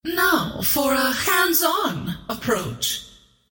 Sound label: attack whip; Queen of Pain